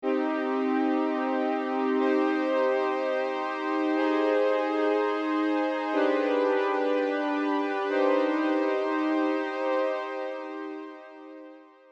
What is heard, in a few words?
Legato Pad